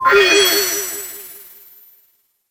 cocktail bonus

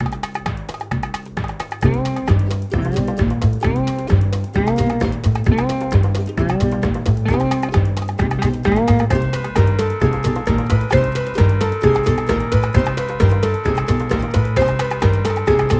Beach

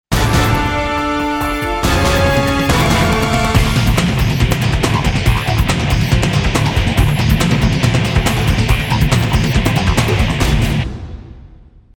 Combat Jingle Enemy; darkstar one; windows xbox 360

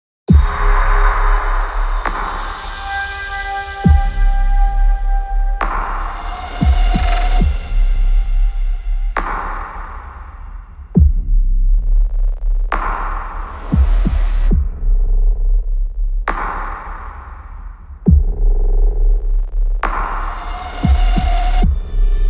Combat Intro Loop 135bpm

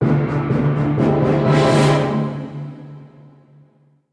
Orchestral Transition Negative Stinger; gamerip; magic rampage

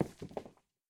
Rock Impact Soft

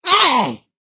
Stand leg poke

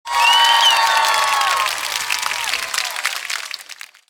BasketBall Cheer Voice Street; VR Sports

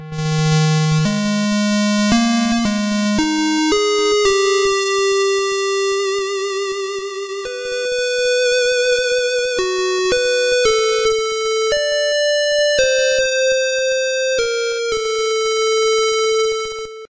Music Tower; Music Tower4 Multi0; shovel knight king of cards

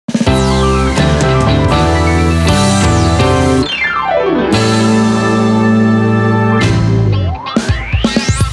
Music Results Fanfare; Stikbold! Dodgeball Adventure Deluxe

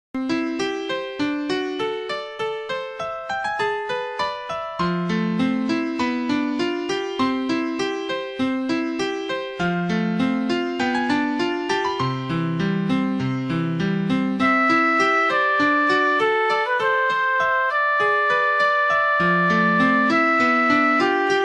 victory

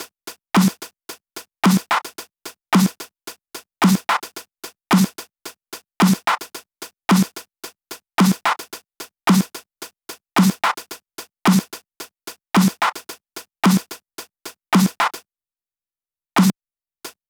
second menu; Crowd Smashers

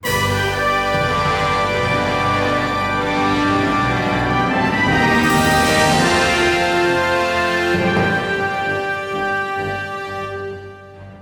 Celebrate Stinger

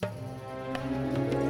Wonderment Start 108bpm; Music Dialogue

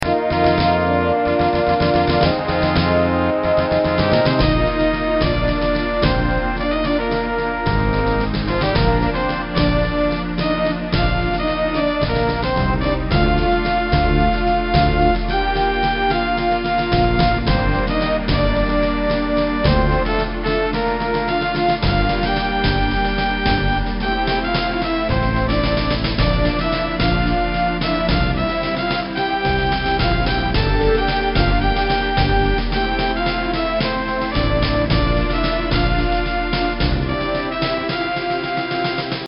Mission Success; Police Quest SWAT

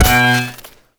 Character Hit

